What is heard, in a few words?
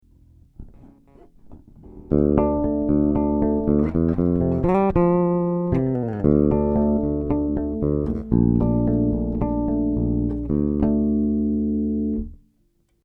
Bridge PU seriell; Marleaux